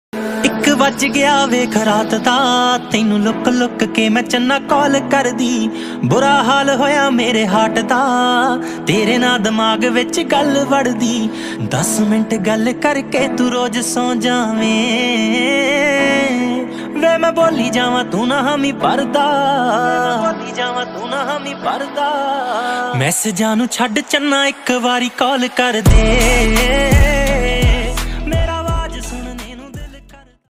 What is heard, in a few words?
Punjabi Ringtone